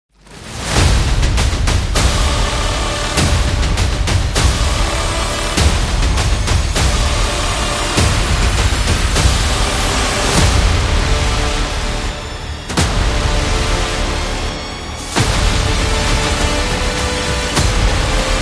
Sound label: Epic Intro